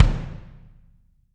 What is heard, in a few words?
BD, Drums; Bob Clearmountain Drums I